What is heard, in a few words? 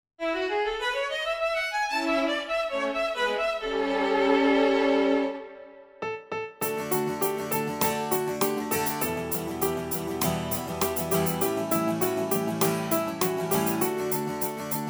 Karaoke